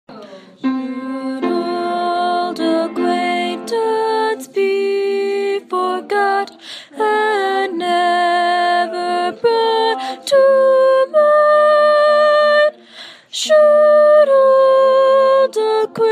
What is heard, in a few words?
auld lang syne soprano